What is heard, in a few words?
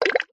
cartoon water spill; zapsplat